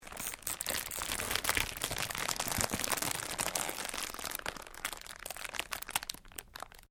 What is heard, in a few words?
Cellophane crinkle